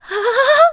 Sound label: Gasp; Sue-Belle; zelda sounds